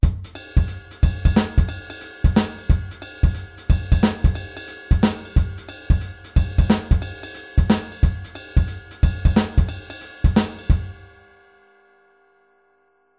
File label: Groove off; Shuffle Offbeat